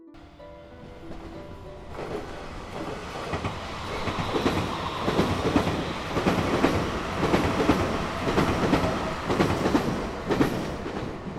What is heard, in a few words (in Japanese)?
電車 通過